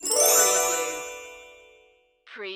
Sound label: Musical